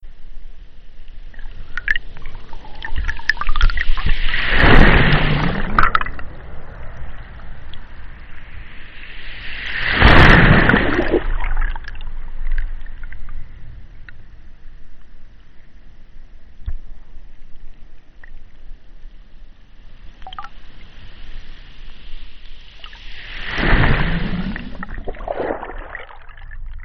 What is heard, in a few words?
sabal palm hydrophone in surf